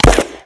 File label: weapon impact flesh; Spearhead Demo; MacFormat 2003 June